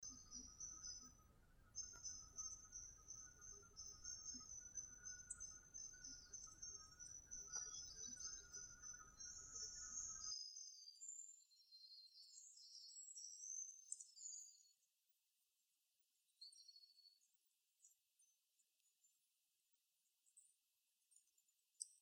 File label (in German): Lichtung Soundaufnahme Botanischer Garten Marburg